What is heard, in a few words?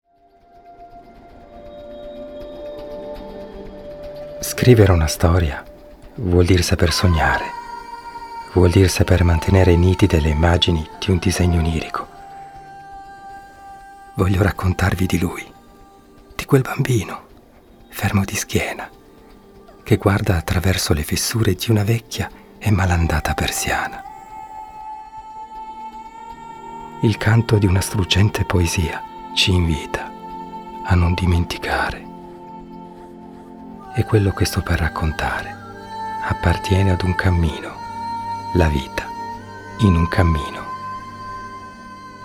Trailer Bounce